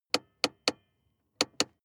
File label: sports car int handbrake pull long; verkehr-transport